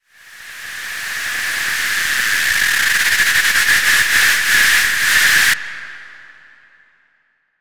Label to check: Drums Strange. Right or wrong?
Wrong